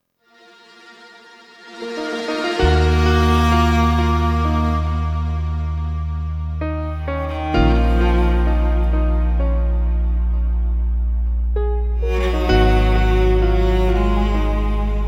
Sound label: piano Version